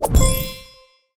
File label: levelend star